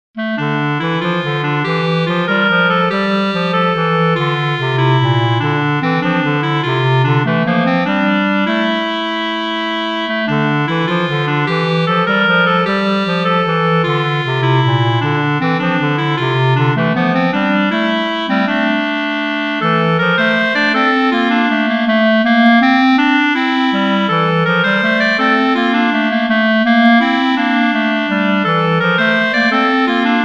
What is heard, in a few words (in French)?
Mazurk